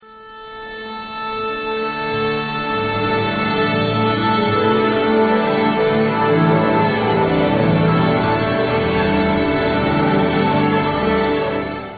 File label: orchestra warmup; Musical Instrument Experience - The Orchestra